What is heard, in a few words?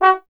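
Brass Trombone F#0; Miroslav Vitous - Solo Instruments VOL-1; 90 s Sample CDs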